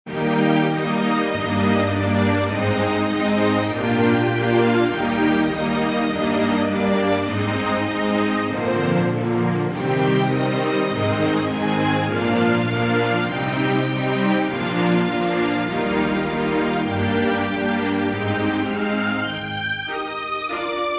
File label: Instrumental